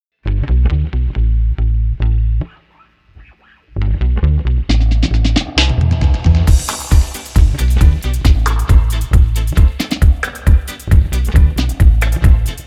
dread drumz mix; dread drumz by soundfingers

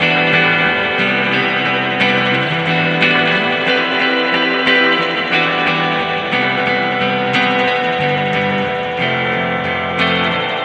guitar chords wet with airspace; airspace hybrid reverb and delay; modeaudio

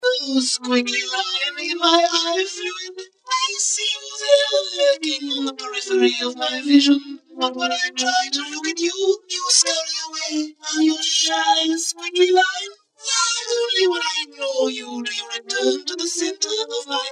squiggly vocoder

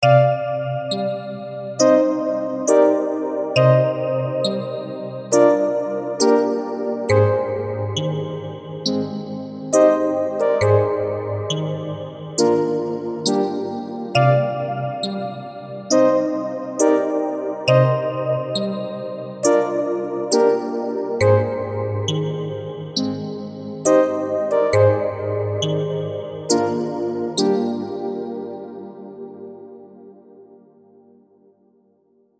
long reverb

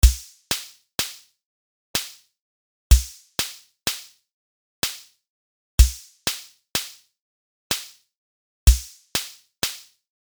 jazz waltz; tiny pops; superwave

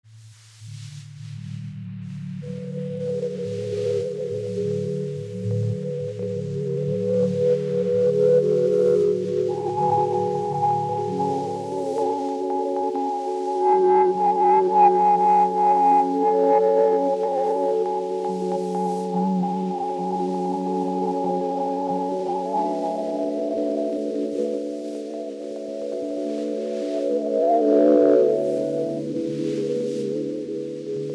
tape loop fun

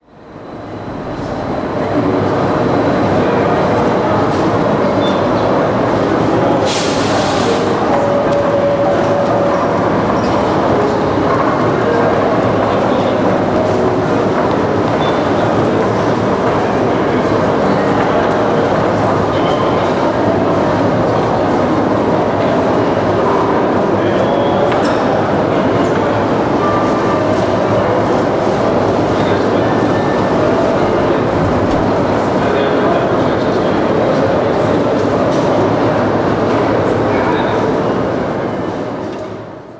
sub; echotopia; soundfellas creative audio studios

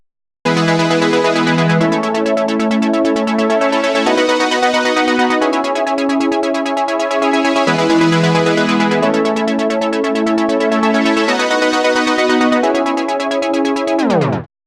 parallel lpfs fast; e-autofilter300

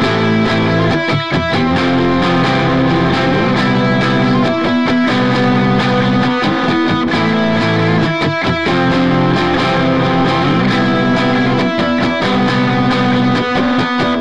guitar riff plugin on; analog glow; tonalmark

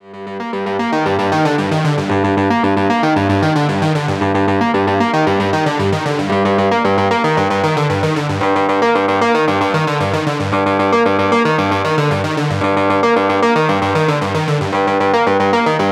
from saw to; porphyra hybrid by ocean swift synthesis

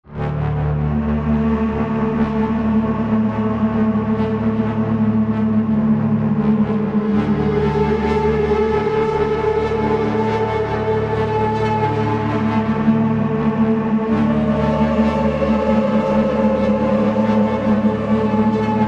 tenor sax extended techniques; cetacea; complete extended techniques collection; elastic sounds

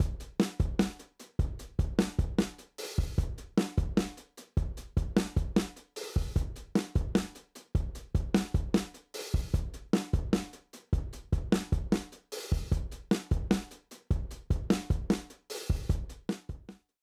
drums, 1970s kit; 1970s presets; exile kit

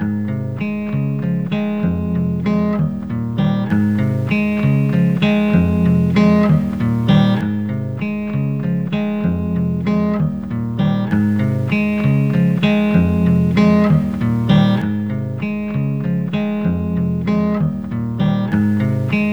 allcomp on a guitar

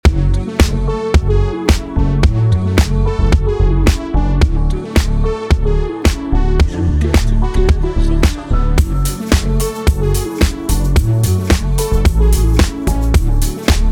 pop; unwritten melodies; monosounds studio

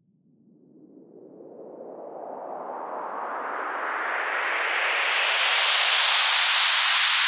riser dry; wavefield

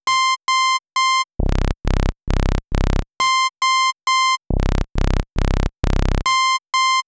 zeb init patch

